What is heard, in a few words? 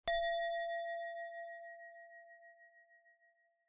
gong